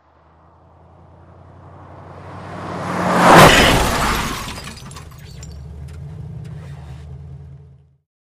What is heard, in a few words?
Scene Two Vehicles Driving At High Speed, Head On Crash